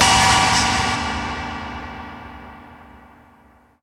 FX Downlifter

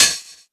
Cymbals Open HH; Vengeance Samples

